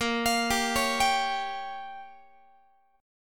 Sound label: Bb Minor 6th, Guitar; Bbm6 arpeggiated